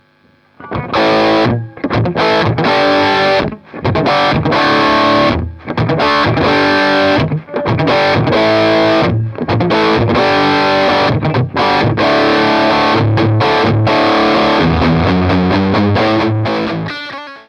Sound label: lead